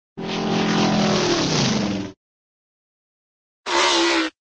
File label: auto, cartoon zoom by; brown's